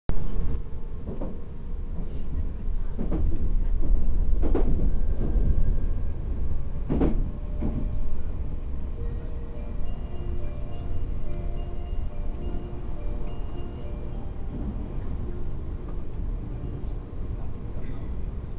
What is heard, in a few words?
VSE chime; odaQ